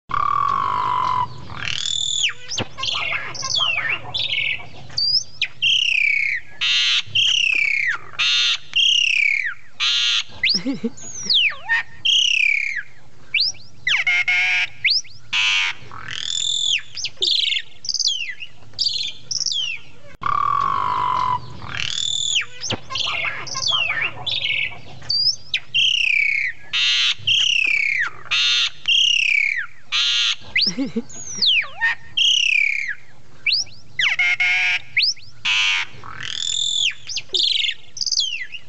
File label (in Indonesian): jalak hongkong